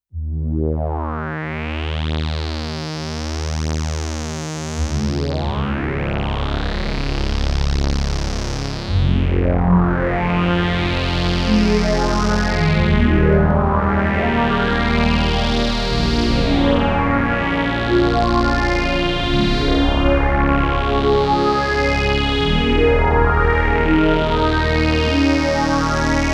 OB-X8 Typische schwere Obie Pad; Origie

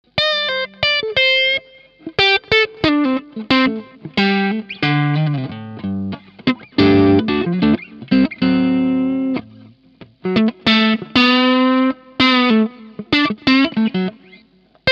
Harley Benton TE 70 Hals crunchy

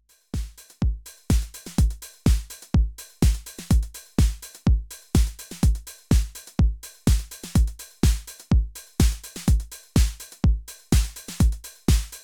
Roland DJ 808 TR S Instrumenten Parameter

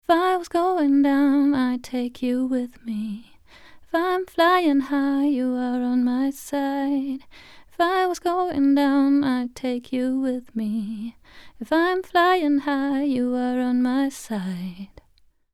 Klark Teknik 1176 KT Vocal ohne 1176 KT

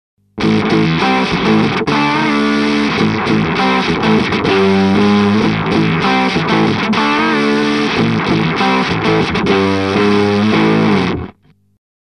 Korg Nu Tekt HD-S alle drei Harmonics gated Fuzz

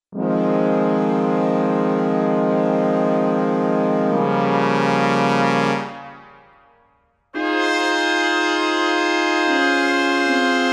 Brass Solo Quartett Sustain f

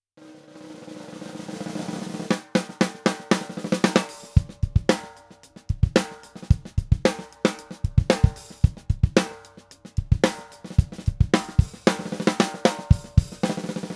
Stahl mittel offen

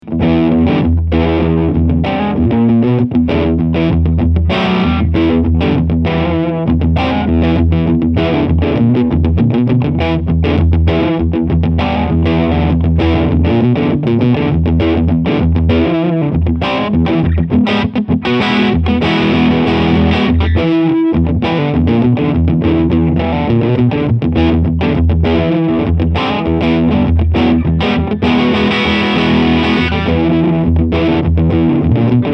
Mesa Boogie California Tweed 4:40 40 Watt Modus FULL GAIN

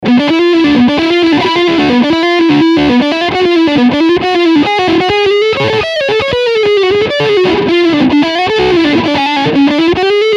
Lick Distortion beide PUs

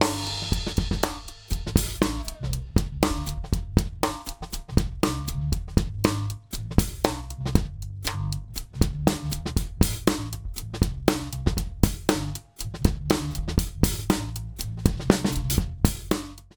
Einzelspuren BD Clicky SN Smack OH; Grandpa

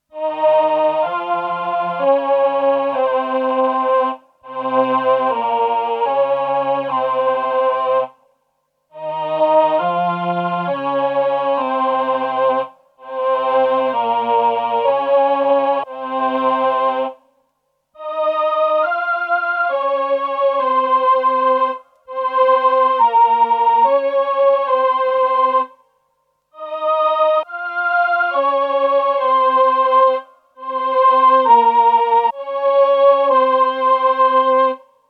VP330 VC340 Chor langes Attack

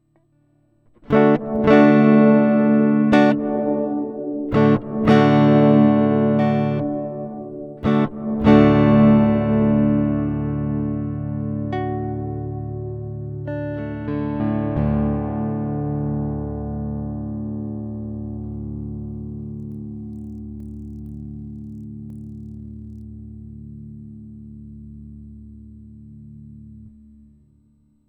Halle

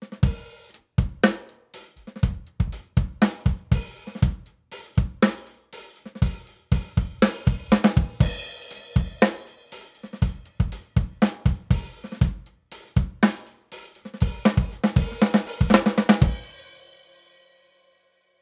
Drums ohne EQ; Gyraf Audio G14-S

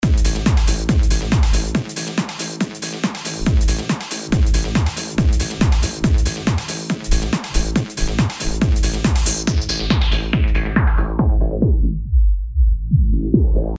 Cutt Off TP HP

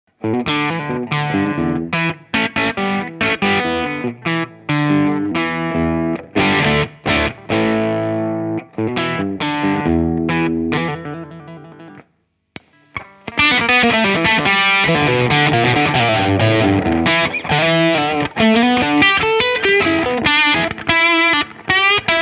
Rangemaster leicht crunchy; British Pedal

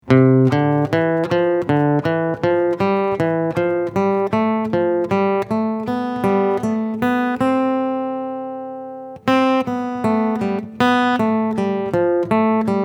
4er seq auf ab

